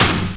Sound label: hit, percussion hit